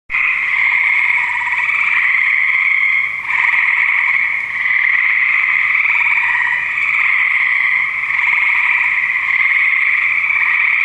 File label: Frogs; All they need is a mild midnight and the frogs will give you a swamp symphony that's perfect for unwinding.; nature